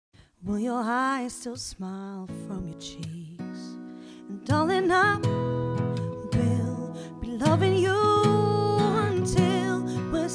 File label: Acoustic Duo Cover